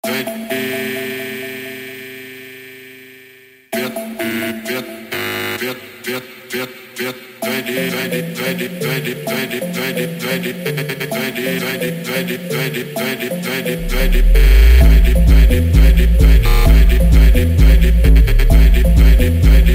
BRAZILIAN FUNK CHILL ZONE

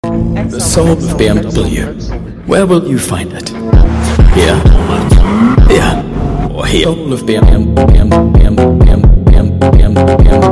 funk

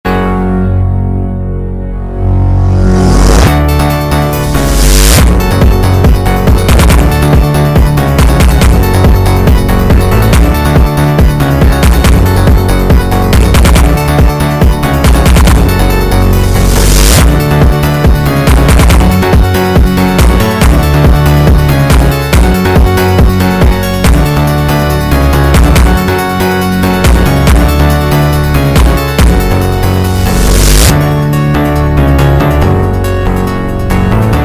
GOOD LUCK INSTRUMENTAL

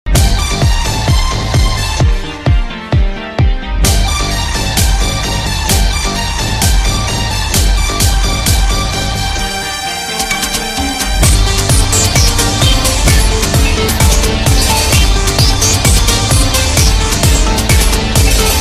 Sword Phonk Funk, motivation phonk

